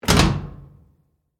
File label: Metal Hatch Close, Scifi, Metal, Hatch, Close, Rocket, Ship, Door, Science, Fiction; Spacecraft; BlastWave